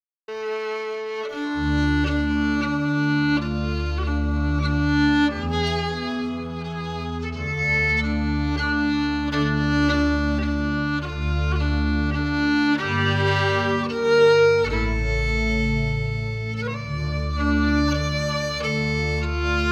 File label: AIRE FIDDLE PIANO; Jink & Diddle Book o' Tunes 2022